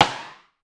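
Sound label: Rim Up Slow, Drum Machines Clavia DDrum-AT Pitch Envelope; 300 Drum Machines; Pitch Env Various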